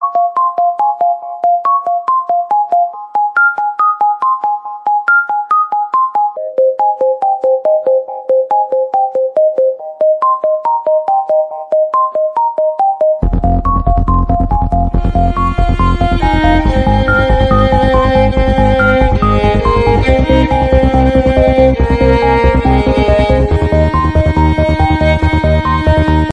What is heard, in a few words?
oneplus loud music ringtone